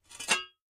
Shovel Picking Up Me

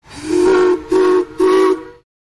Steam Train Whistle, horns

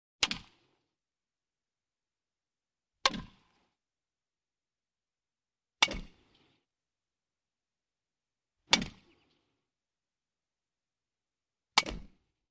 Baseball Bat Hit Mix; baseball fx & games

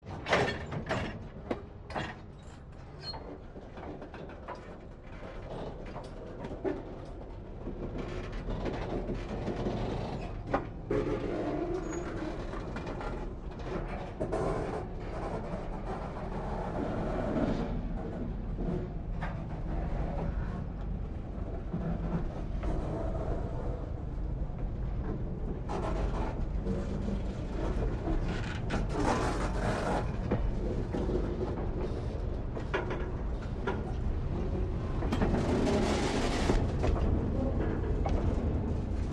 Train Railcar Coupli, couplers

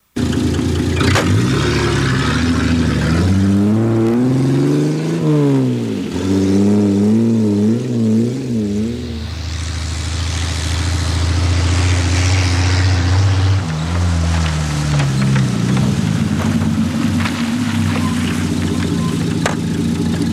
Land Rover Out Stop

